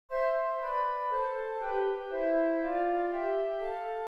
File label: thirds sixths